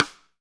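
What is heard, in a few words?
snare drum rim; Best Service Gigapack 2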